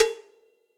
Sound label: Cowbell Big Deep Snare Dynamics; Drum Hits; Ableton; Factory Packs; Session Drums Club; Standard Hits